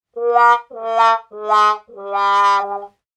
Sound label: sad trombone; Loupedeck Sound Pack